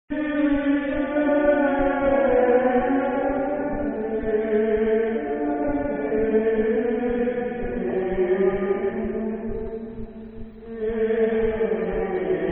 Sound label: kyrie eleison